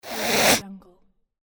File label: cat hiss